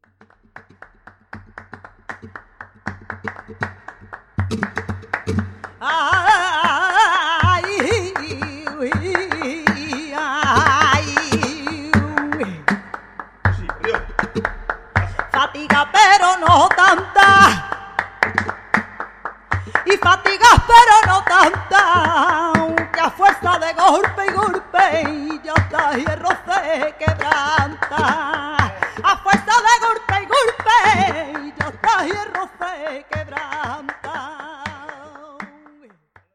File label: Bulerias